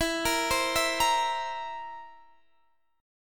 Bb Suspended 2nd Flat 5th

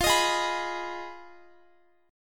F Minor 7th Flat 5th, Guitar; Fm7b5; strummed

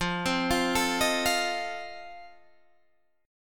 F 7th F down Guitar Standard; F7 arpeggiated

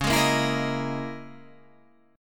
C sharp Minor 6th Add 9th C sharp

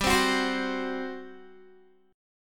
G Major 7th Suspended 4th, Guitar; GM7sus4; strummed; 15,15,16,17,18,x

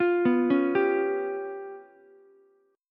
C sharp 7th Flat 5th C sharp down Ukulele; C#7b5; arpeggiated; JGuitar

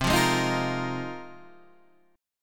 Ab 6th Add 9th C

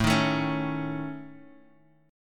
A Major Flat 5th, Guitar, Half Step Down

